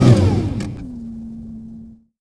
shutdown; snds jack gmod

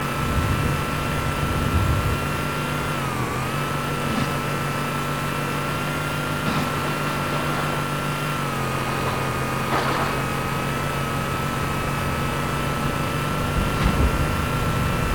warthog drive, engine

